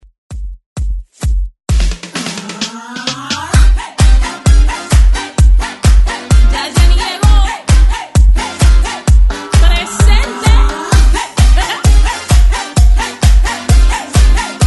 moombah transition 130 110

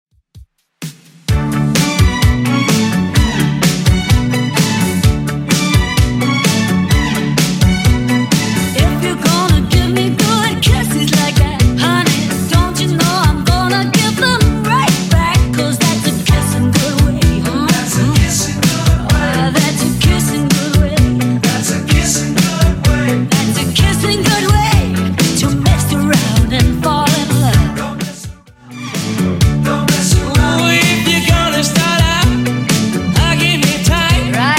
80s Redrum 128 bpm; remixplanet

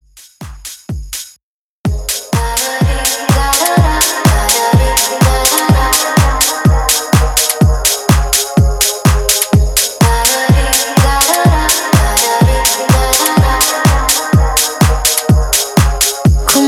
Latino Dance Extended In Outro 125 BPM

